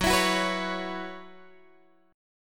F sharp 7th Suspended 4th, Guitar, Open G

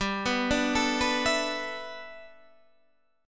G 6th Add 9th G, chord; G6add9 arpeggiated; 15,14,12,14,12,12

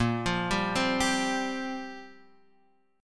F Suspended 2nd Suspended 4th Bb; Fsus2sus4/Bb arpeggiated

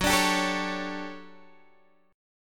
D Major 11th F sharp, chord

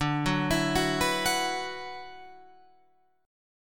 D 6th Add 9th D, Guitar, Open F; D6add9 arpeggiated; 14,13,16,13,14,13

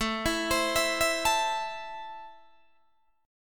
A Minor, Guitar, Open D

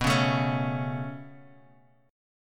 B 7th Suspended 2nd B down Guitar Half Step Down

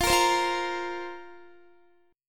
F Suspended 4th F, Guitar; Fsus4; strummed

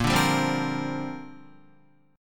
A sharp 6th Add 9th, Guitar; A#6add9 strummed; JGuitar

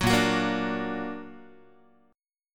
Bb Augmented Bb, Guitar; strummed; JGuitar

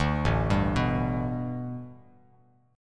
A 9th Suspended 4th Bass Standard, chord; A9sus4; arpeggiated; JGuitar

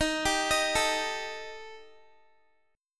D sharp Major Flat 5th, Guitar; arpeggiated

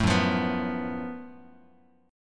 F sharp Minor Major 11th, Bass Standard, chord; strummed; JGuitar

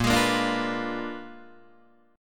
A sharp 7th Flat 9th, Guitar Open D chord

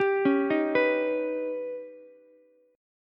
D sharp Augmented 7th D sharp, Ukulele; arpeggiated; JGuitar

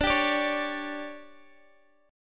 D Major 7th Suspended 4th, Guitar, DADGAD

